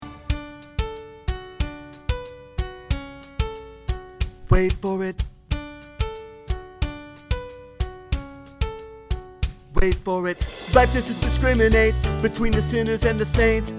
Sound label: tenor